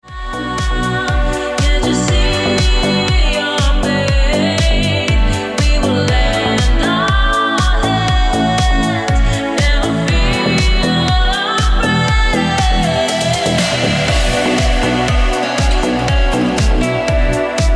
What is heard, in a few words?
Ringtone